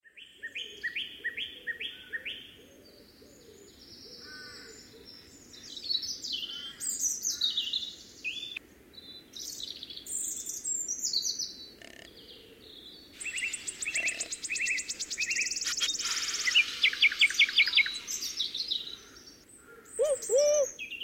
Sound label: ambiance